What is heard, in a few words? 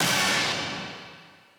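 b stab1b, note F octave 5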